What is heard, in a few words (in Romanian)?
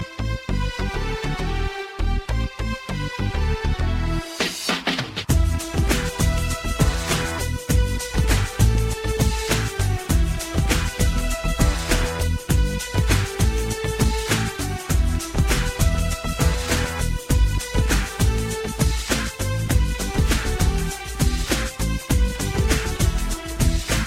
Melodie Veche